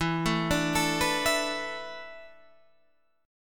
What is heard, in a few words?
E 7th Suspended 4th, Guitar, Half Step Down; E7sus4